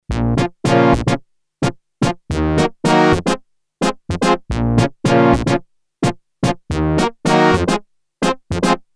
yamaha tx81z

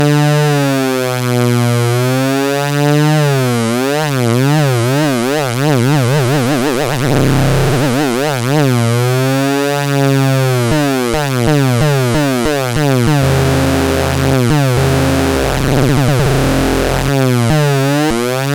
all lfo; Creamware minimax

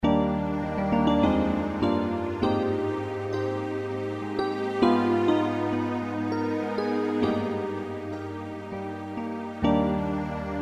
yamaha tg33

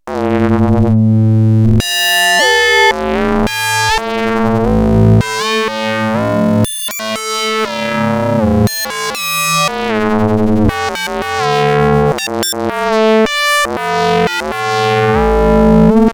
ring me, Novation Bass Station 2

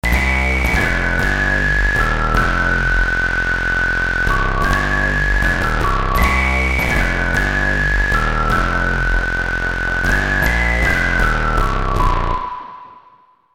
synth bass synth, Roland JD800; SYNTH whistle 70

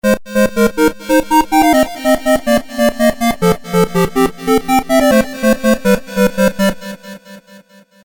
synth square, roland jd800; SYNTH squared

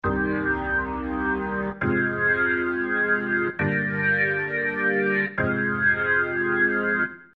organ purple; roland mvs1

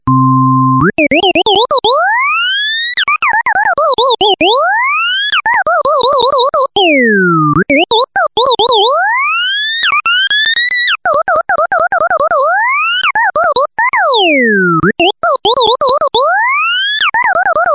fx robotalk, yamaha tx81Z

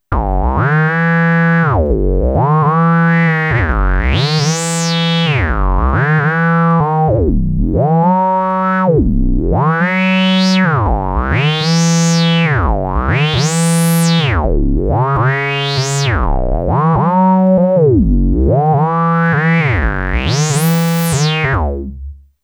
synth glide; Glide a gogo; Polivoks formanta

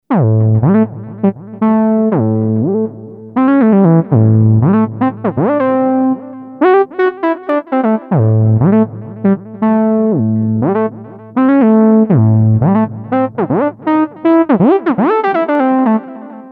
synth duck; Creamware minimax

